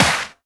snares; Vengeance Samples

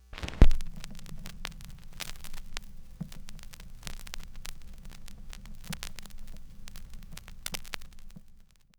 Record Start Long, Vinyl Record; Record Noises

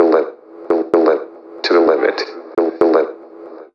House Loops, Vocal Loops House 128BPM

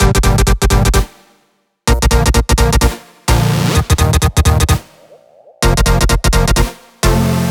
128BPM Melody; Vengeance Samples; VFH1 128BPM Machine Kit

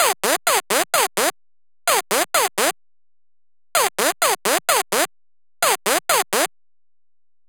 128BPM Melody; Vengeance Samples; Praetor Kit

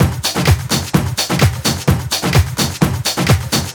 Latin House Loop 128 BPM; Vengeance Samples

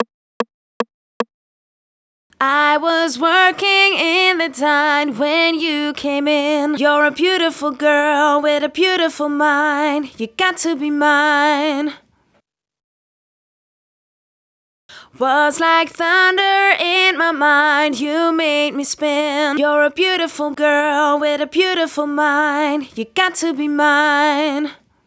Verse Girl, 150BPM; Vengeance Samples

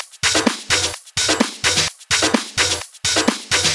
House Loop, 128 BPM; Vengeance Samples

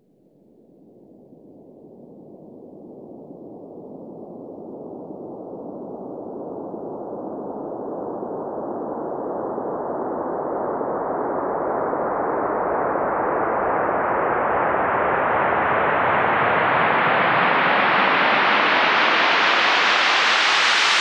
Sweeps Long

